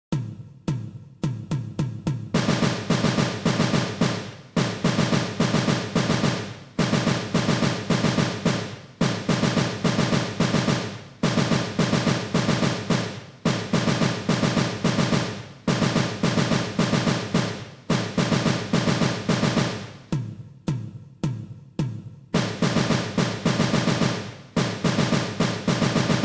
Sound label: Taps - Diddles @ 108 bpm, percussion; percussion methods